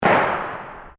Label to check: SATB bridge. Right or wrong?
Wrong